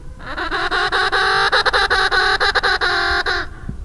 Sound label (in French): empereur adulte